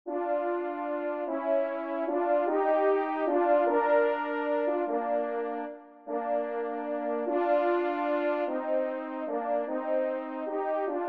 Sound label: DUO La b Maj; Kling; duo cors n 26